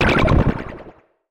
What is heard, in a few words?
beam gun